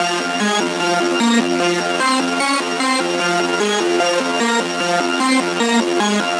Lead Loop F Distoharmonic, Dubstep, 150bpm; Ghosthack; Dubstep Sound Arsenal

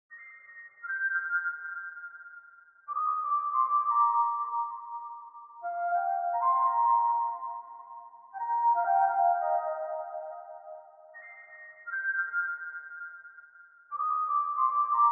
87 BPM C Min Flute Lead; Melody; RnB; Loop Stems; Cymatics; 2022 Melody Collection; RnB Pt 2; Thunder

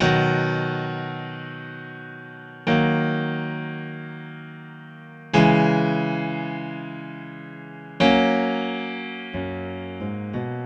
Grand Piano - 90 BPM (B Major); Good Day; Ghosthack; Free Guitar And Piano Samples

